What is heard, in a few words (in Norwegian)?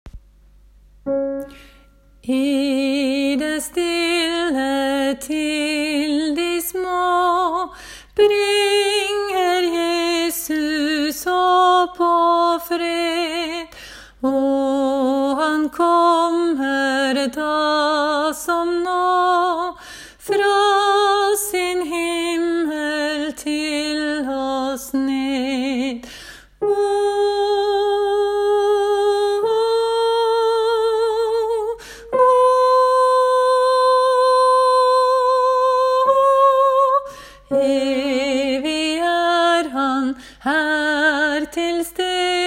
sopran; i en natt; åfjord